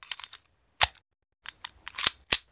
nabijeni; Mafie 1

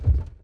opreni; Mafie 1

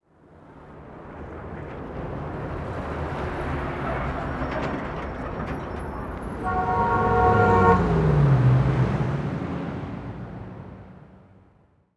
in autko; Mafie 1

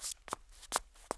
kroky; Mafie 1 cz

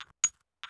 leky; Mafie 1

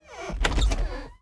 dvere privreni; Mafie 1

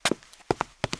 kroky; samkroky; Mafie 1

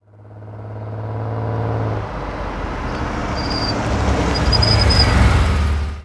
auto; Mafie 1

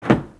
krabice; Mafie 1

